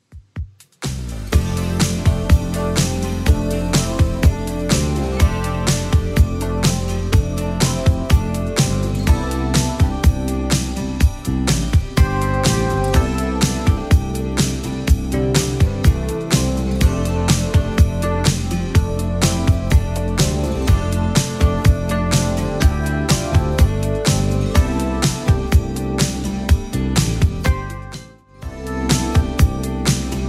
80s Redrum 124 bpm CLEAN

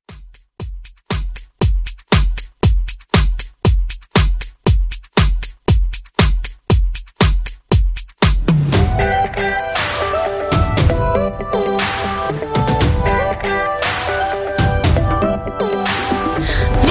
OPM Mixshow Intro 118 Bpm

